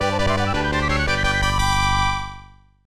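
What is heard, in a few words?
background music; Block Block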